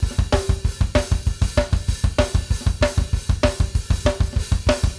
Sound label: Drumworks; Sampleheads; New York City Drumworks VOL-1